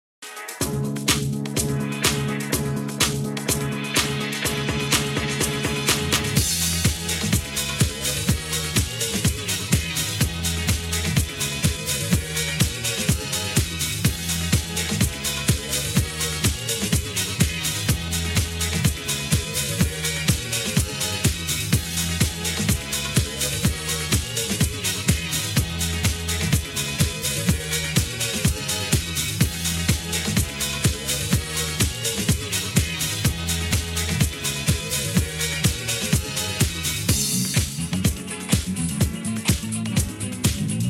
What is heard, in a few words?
DISCO